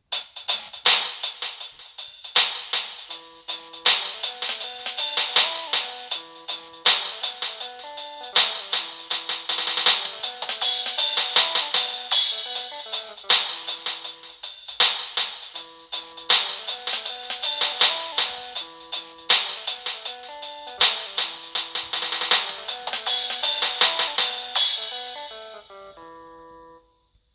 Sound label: A Ringtone